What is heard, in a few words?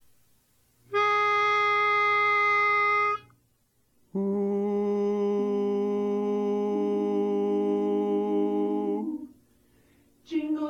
Jingle Bells Bass